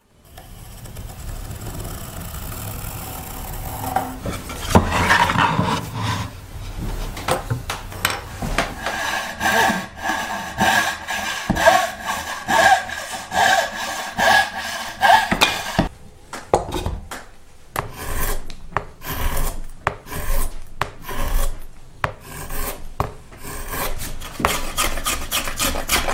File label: violine